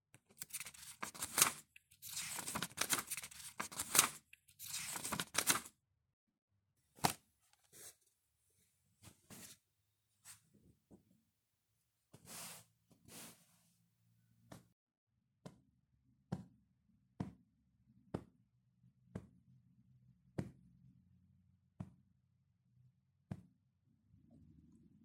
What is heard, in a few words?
Memory Soundscape